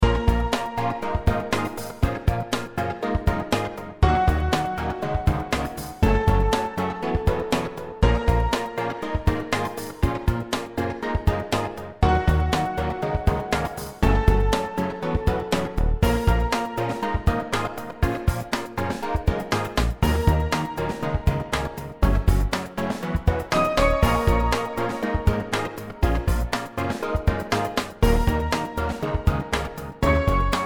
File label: Music loop 120 bpm